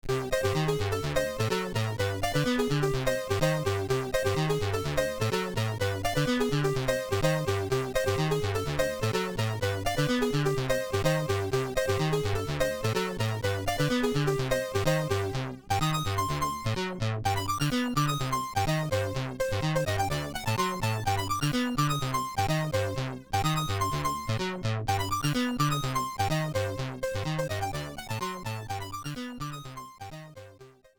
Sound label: Piano Wamp; sid